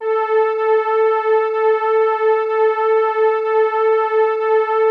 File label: WARM STRG A4, SYNTHSTRINGS; Music Store - Claudius Diemeter Schwerlos